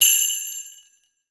GIANT SLE, TREE BELLS, Percussion; Voice Crystal - Steve Reid Definitive Percussion

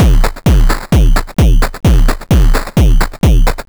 Crushed Beat 130BPM; bitcrushed; musicradar

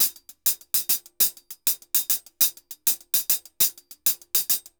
HH Bomba 100, Drums & Percussion; Best Service; Festa Latina VOL-1

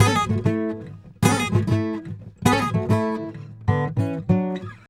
Traditional Guitar 098bpm A, African Guitars; Quazibeat - African Secret

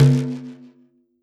drum, WORLD DRUMS; World Instruments 2; PS MIXED DRUMS

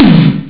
tom tom; Amiga Soundtracker sample

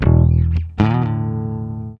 bass, disco; Best Service ProSamples vol.48 - Disco Fever; AKAI

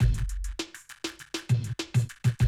drum loop, 100 BPM

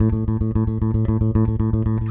Sixteenth Notes A, Bass

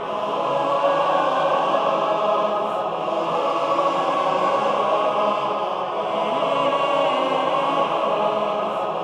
ROUND, ROUNDS, Symphony of Voices

